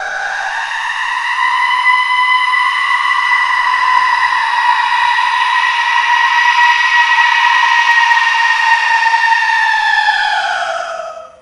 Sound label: goat sreeeeeeeeeeeeeeeeeam